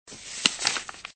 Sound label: Message; bobtherobber2